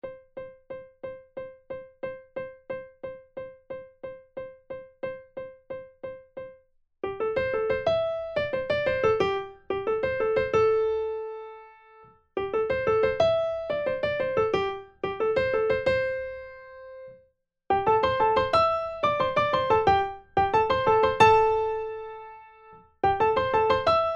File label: Sailboat 180 Primo